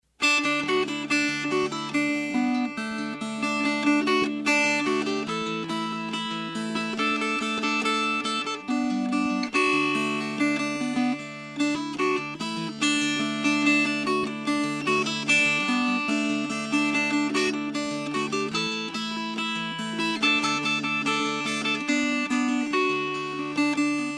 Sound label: Fingerpicked